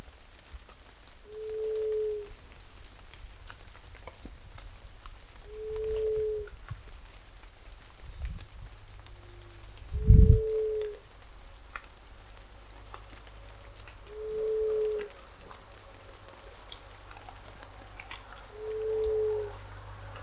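Paloma Montera Castaña, Geotrygon montana, Ruddy Quail-dove; cantos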